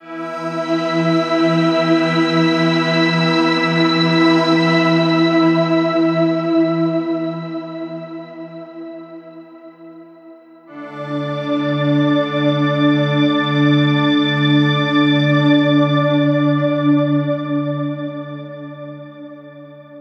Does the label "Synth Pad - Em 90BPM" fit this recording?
Yes